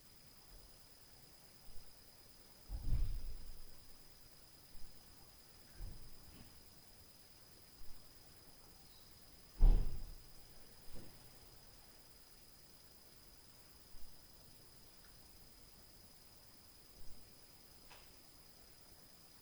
BOOM + STREET CAR NOISE

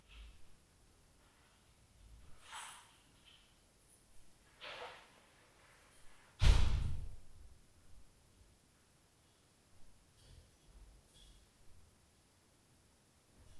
Door, Night Noise

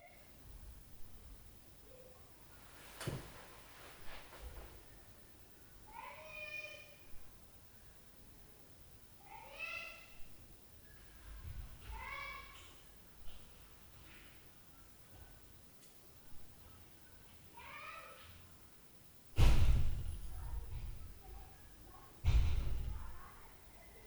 Floor NOISE + DOOR, Night Noise; Can't sleep

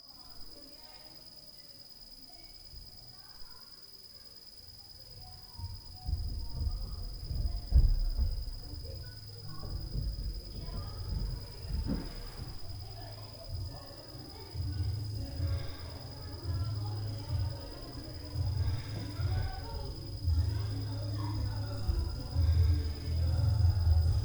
NOISE CRY DOOR; Night Noise; STILL 07Min of NOISE CRY DOOR SPEARS FRONT OF MY DOOR

